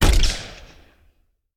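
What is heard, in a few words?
Artillery Grenade Launcher; Sound Ideas; Guns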